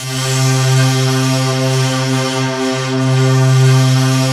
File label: PAD, Drum & Bass, 160 BPM; Ueberschall; Drum & Bass Flash Trackz